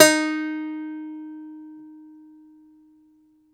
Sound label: DOMRA D, Prima Domra, Plucked Strings